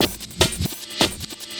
LOOP, 150 BPM, Drum & Bass

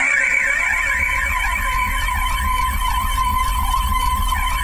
CRY MACHN, Anime Sound FX, PSYCHO

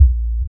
K muffle, Drumsynth Drums; Percussion

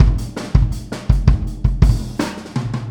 Boogaloo Fill Kick, 82 BPM, Drums; Sonic Foundry (Sony Creative Software) - Drums from the Big Room The Mixes

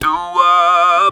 Talk Box

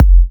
Kicks F; markus hakala

